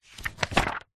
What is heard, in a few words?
household book manual large page turn; zapsplat